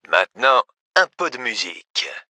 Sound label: music